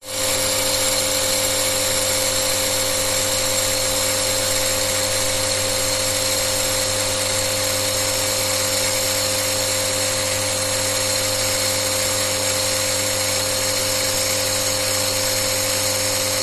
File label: industrial machine water purifier ionic systems pump operate